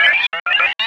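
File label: sphere blips large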